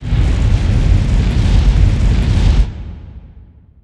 Fire Loop; Yu-Gi-Oh! Master Duel

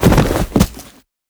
Fishmen Rollover

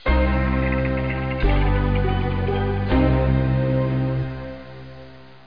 Ghost Stinger

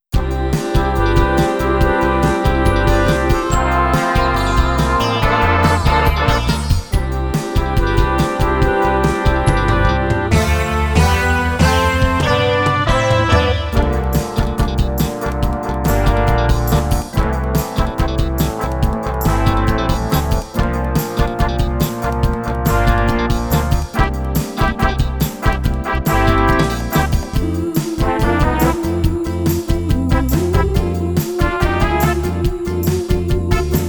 instrumental; beatmania 2nd MIX 1998

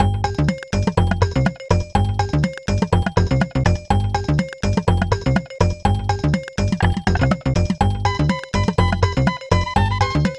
Jumbled Jungle; Dino City; SNES

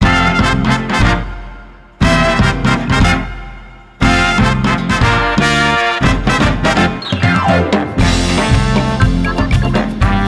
Sitar Orch

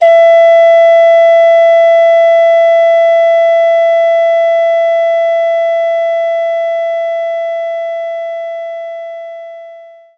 Ocarina E5

